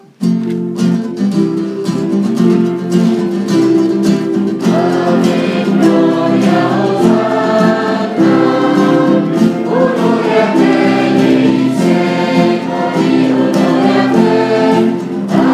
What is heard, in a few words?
Amen